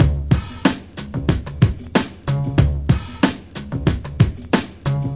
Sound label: 093 hiphop con funky string, DRUM LOOPS and BREAKS; 091 to 100 bpm